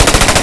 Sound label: rpk74; The Sum of All Fears